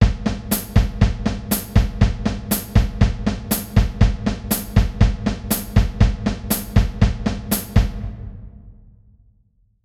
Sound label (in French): Indépendance 60bpm